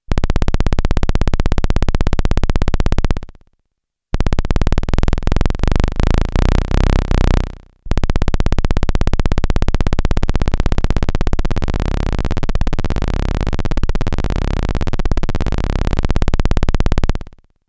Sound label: Cybersound T1 VCO A lowest tone